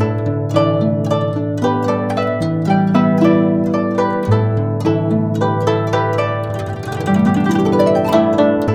GUCHIN 110 G; INIS - Opium

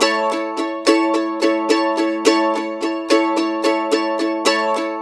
Blues Guitar Samples, RIFF; ILIO - Fretworks

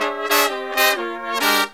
Killer Brass Riffs

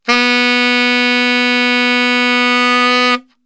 B 1X SAX STR, SAX STRGHT; Best Service; Brass Super Section

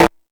Timbale, E-MU Pro-Cussion

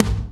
bass drum; Zero-G - Chemical Beats; BASSDRUM ZOO

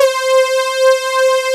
SYN BRASS; Pro-Rec - Synthsonic

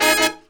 F DBL HIT, Hip Hop; E-MU Formula 4000 Series Vol. 1; Hip Hop Nation

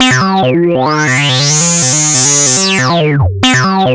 ACID LINE; ACID LN

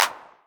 909 Clap, Novation Drumstation; Novation DrumstationV1

